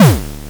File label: Kick Dirty, Lo Fi Drum; Kicks